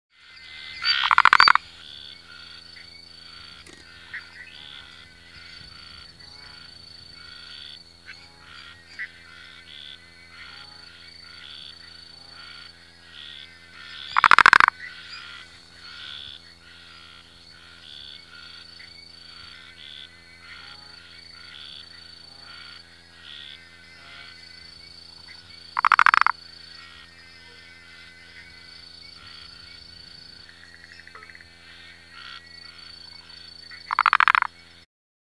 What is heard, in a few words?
Phyllomedusa tetraploidea perereca-das-folhagens